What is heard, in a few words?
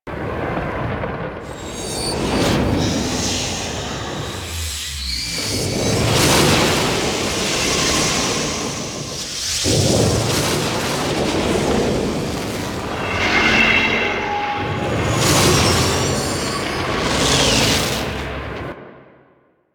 Fire Wall Loop; Warframe; The New War; Archon; Amar; Abilities